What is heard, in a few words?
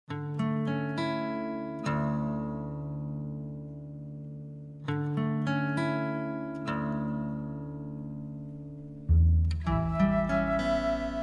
Music